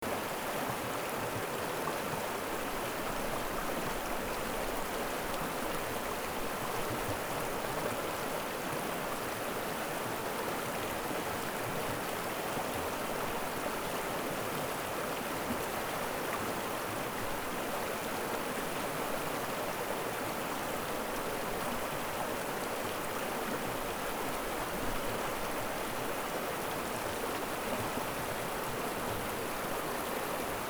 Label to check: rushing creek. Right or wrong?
Right